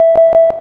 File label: Checkpoint; Muscle Car 3: Illegal Street